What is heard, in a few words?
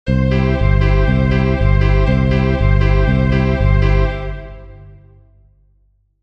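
split piano violin bass; klavir housle basa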